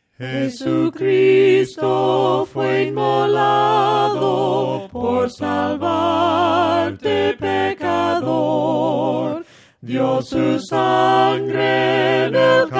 VOCES, himnos a capella; cantos del camino; JESUCRISTO FUE INMOLADO

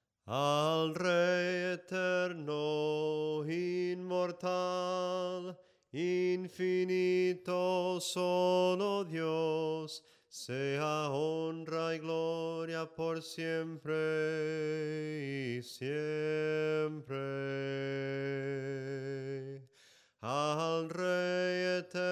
SOPRANO CANTADO

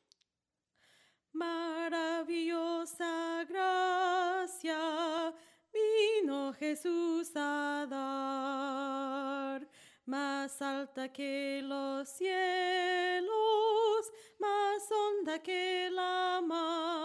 SOPRANO CANTADO, himnos; MARAVILLOSA GRACIA

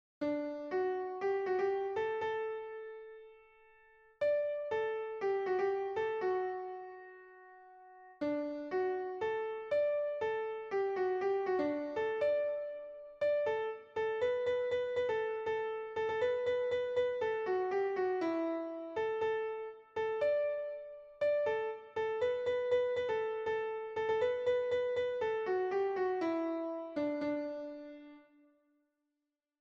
SOPRANO, himnos en piano; A JEHOVA INVOCARE; cantos del camino

